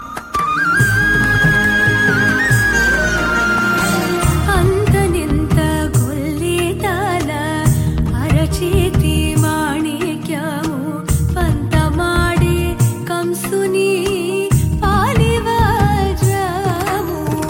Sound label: Bollywood